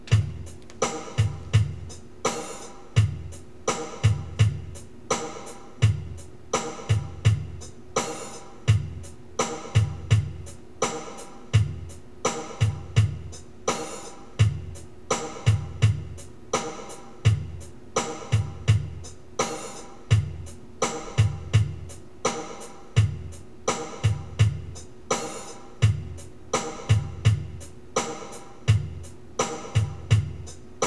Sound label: drum loop